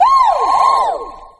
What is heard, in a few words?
"woo" female warped, voice